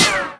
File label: Ricochet